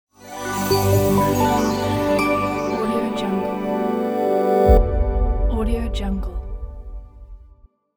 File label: soft clean logo reveal